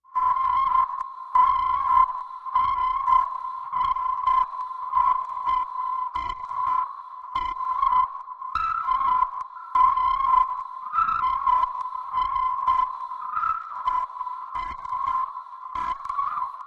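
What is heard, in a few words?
Lost Satellite Machine, communications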